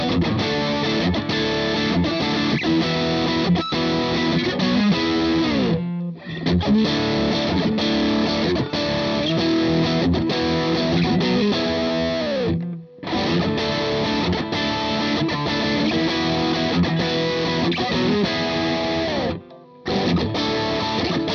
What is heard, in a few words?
Fender strat 65 OD gain